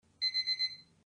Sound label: countdown